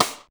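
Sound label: snare drum rim; Best Service Gigapack 2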